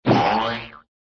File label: tromboing; cartoon; hannah barbera cartoon sound fx